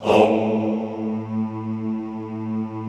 HOMMM A1, Extended Classical Choir; Best Service

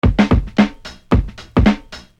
Beat, Hip-Hop Street Beats

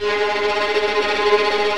TREM VIOLINS

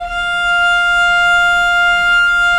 VL F4, VIOLE, Historical Instruments; USB Soundscan vol.23

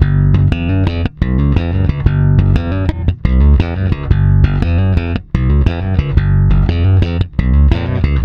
PWR G#, Bass, PWRSTRUM; Spectrasonics; Bass Legends; 128 PWRSTRUM

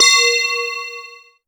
C#, BELL GONG, Synclav FM; Big Fish Audio

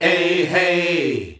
EHEY F, MALES; Best Service; Hyper Dance

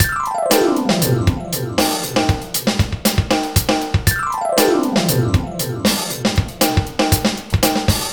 Gliss 2fer Full, lounge, 118; Zero-G Kocktail Kollection; Construction Kitz (Lounge); Gliss 2Fers Mixes 118

